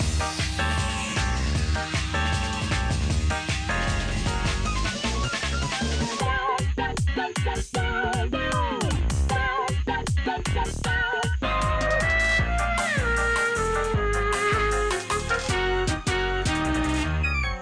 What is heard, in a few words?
Phase1 Night